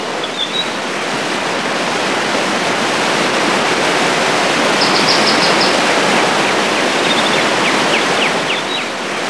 RIVER BIRDS; Zero-G Ambient 1; BIRDS + BATS

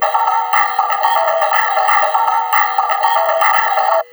RYTHM 120, Drum Loops Crazy Processed

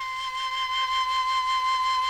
CHAMB FLUTE C#5; Sonic Reality; Vintage Timetraveler

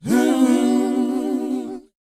WHOA A, Vocal, R&B & Dance; ILIO; Vocal Planet

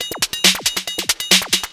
drum 138, drum-loops, 2bass, Techno; Techno ID

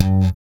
SLP G#3, bass; Spectrasonics; Bass Legends

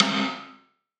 rim, gated reverb; musicradar

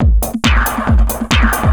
138, Techno, drum loops

